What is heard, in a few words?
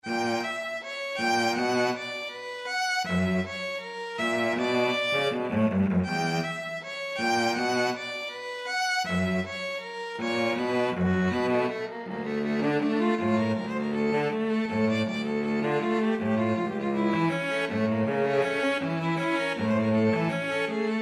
violin cello duet